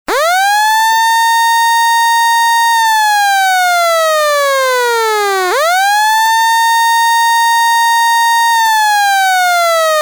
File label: alarm